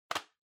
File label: Calculator (Hand Tilt)